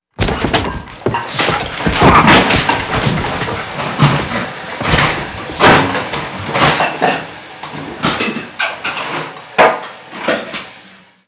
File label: interior scuffle; favela; Call of Duty Modern Warfare